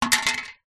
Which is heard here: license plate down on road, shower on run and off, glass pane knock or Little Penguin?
license plate down on road